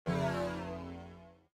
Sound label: projectile; Smite; god Janus